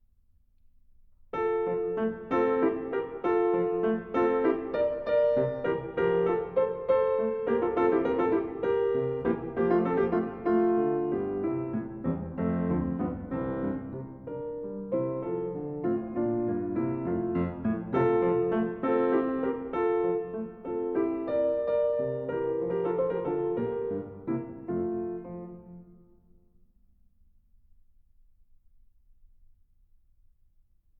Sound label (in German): sonate f dur allegretto; woo 50 2